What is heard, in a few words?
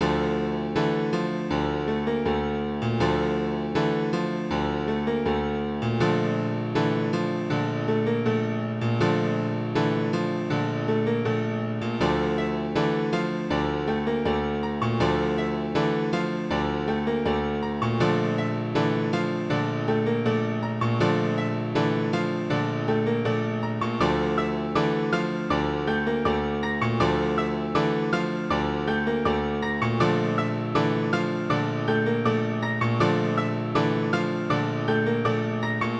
Piano in D Min; Renoise